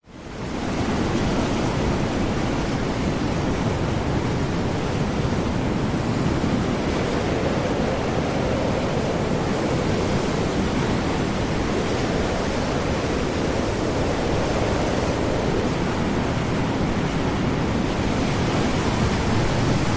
ocean storm high winds heavy large waves hurricane; nature